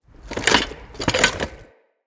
Smithy Metal Misc; Ambience; Soul Plane

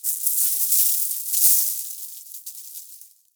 Mountain Pass Snow Hit Player; Ambience; Warframe; The War Within

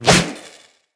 Electro Prod Whoosh; Weapons

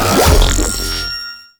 Spawn; Warframe; Sentients; Repeater

